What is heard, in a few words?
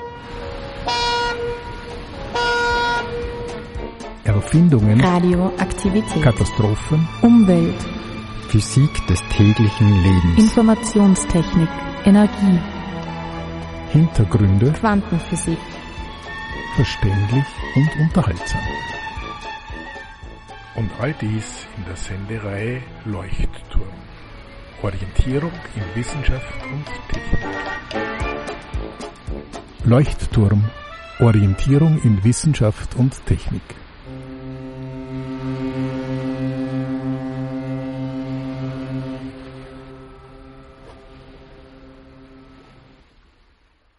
jingle; leuchtturm